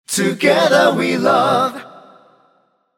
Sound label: ringtone